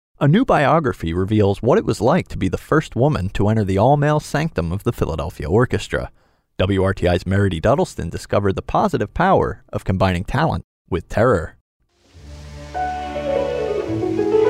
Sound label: Harp